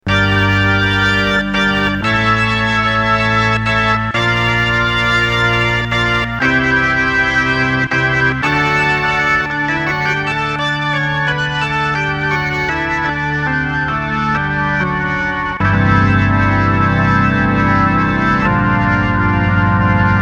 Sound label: hammond c3 slow leslie